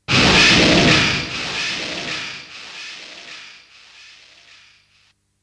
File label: gasp, Vocal Samples